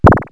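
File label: short squirt, cartoon; Wired for Sound Pro CD; Aristosoft, Inc.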